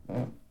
Stairs footsteps; Phasmophobia

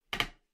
Creak Floorboard; Phasmophobia

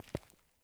FOOTSTEP Asphalt Trainers Walk Slow mono

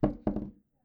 wood; Phasmophobia

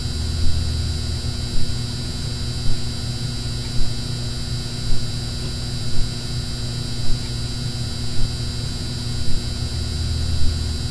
Light Humming; Phasmophobia

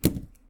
Smudge drop; Phasmophobia

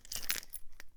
Key Pickup; Phasmophobia

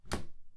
Cabinet Close; Phasmophobia

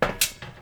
Truck sprint; Phasmophobia

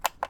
Paramic On; Phasmophobia

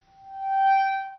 Metal Whine; Phasmophobia

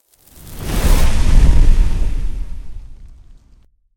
Crucifix Burn Start; Phasmophobia

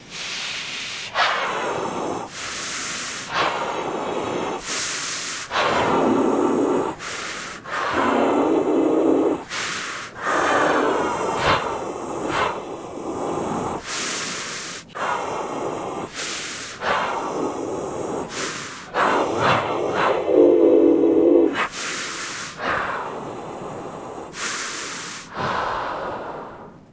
Snowman hoho; Phasmophobia

